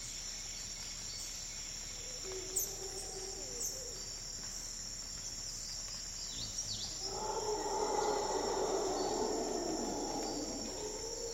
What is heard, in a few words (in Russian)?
utrennee bezmyatezhnoe zavorazhivayushee sverchanie sverchkov na ozere